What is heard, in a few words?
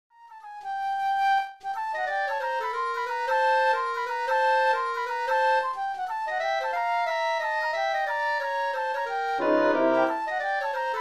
Bebop Study Quintet Only MIDI